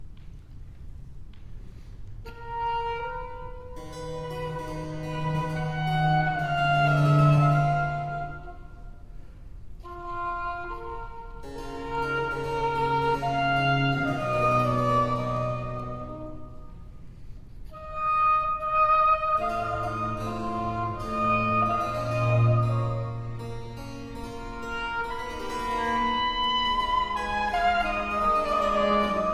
Adagio Sonata for Oboe and Basso Continuo in G major; Sammartini